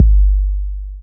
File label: BD Lowa, Drum Machines; EMU Orbit-3